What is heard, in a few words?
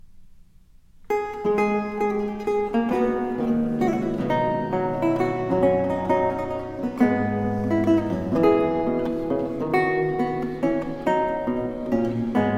Courante, Pieces in B minor; Livre de tablature; III. Courante